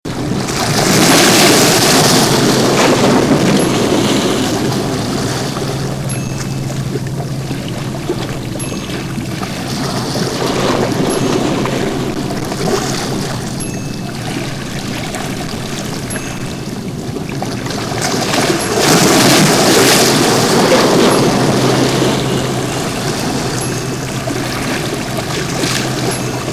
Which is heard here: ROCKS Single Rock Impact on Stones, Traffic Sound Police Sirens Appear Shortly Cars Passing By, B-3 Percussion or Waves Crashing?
Waves Crashing